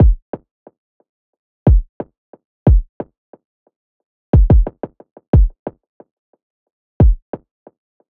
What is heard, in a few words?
Kick Wet; United Plugins Pluralis